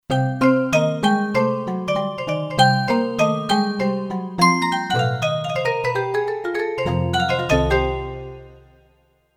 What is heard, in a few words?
Kurzweil K2500X Toy Buphone